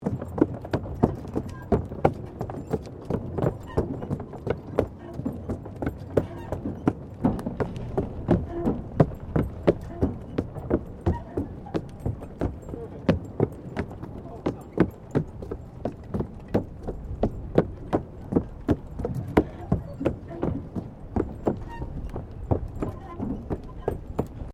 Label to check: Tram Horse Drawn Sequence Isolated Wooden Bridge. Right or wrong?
Right